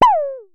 Synare Filter; Star Instruments Synare 3

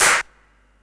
CLAPS HIP, CLP; 90 s Sample CDs; Best Service Dance Mega Drums

